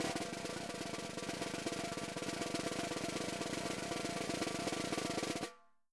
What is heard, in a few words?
4X10 SOPRANO, Drums; Sampleheads; Peter Erskine Living Drums